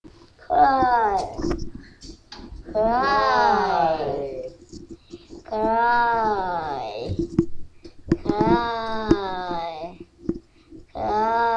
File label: cry